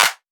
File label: Clap; Drum One Shots